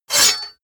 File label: sword cut hard slash